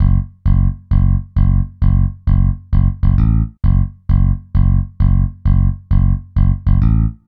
Roundwound Bass; Dr. Fink's Funk Factory

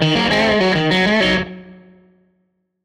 Strat Guitar Loop

